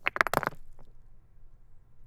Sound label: song